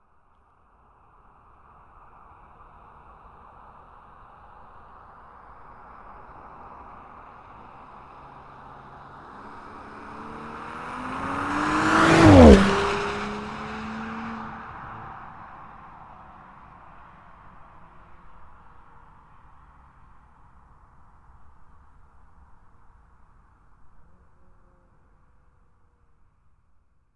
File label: porsche macan s ext passby acceleration 60 kmph stereo; sonniss gdc